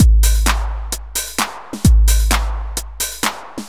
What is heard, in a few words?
130 C trance break; Trance Explosion Vol1